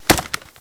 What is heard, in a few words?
football helmet hit; Sony Pictures Sound Effects Series